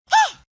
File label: haaaaah